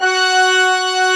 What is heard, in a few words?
BRASS 2 F4 -R; AKAI S6000 CD-ROM - Ultimate